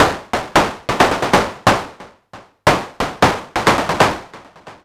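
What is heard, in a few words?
SCUM snare drum; Big Fish Audio; Uncivilised G'rooves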